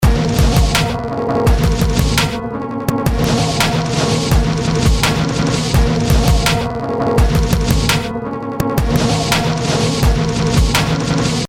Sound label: ghost in the machine